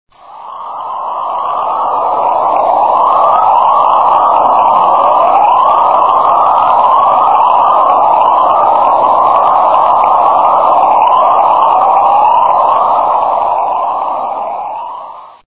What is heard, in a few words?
spherics dawn chorus